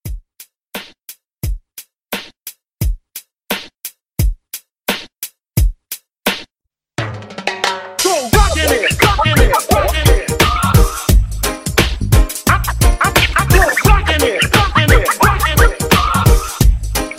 90s Scratch Hype 87

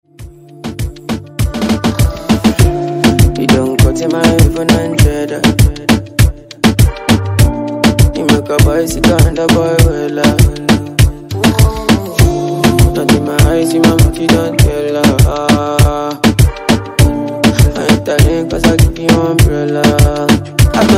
Afro Redrum

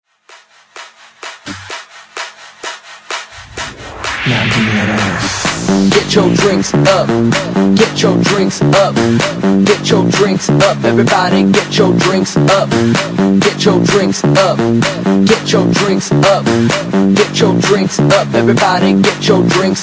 Remix Hype 128 Bpm; DJDOLLS

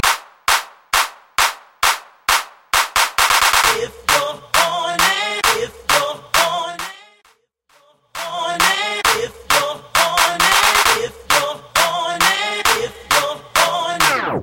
90s Clap A Pella Starter Moombahton RMX 99 Bpm

